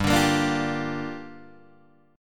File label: G Minor 7th, Guitar, DADGAD